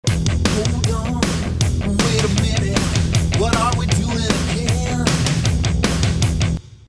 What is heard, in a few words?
Rock Double Trk